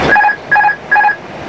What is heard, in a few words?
ap disconnect